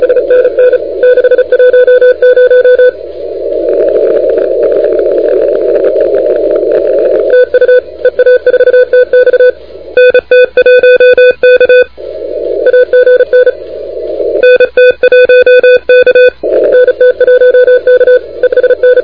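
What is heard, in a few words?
continuous wave 28MHz; ARRL10M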